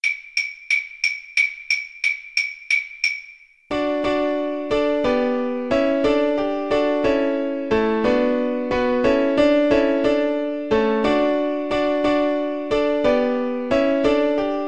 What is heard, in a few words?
carol, Cello; sussex